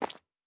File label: Footstep Concrete Walking